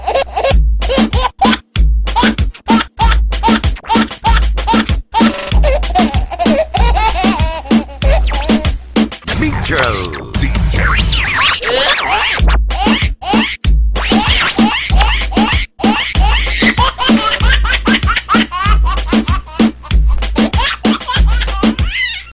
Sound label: baby sound funny ringtone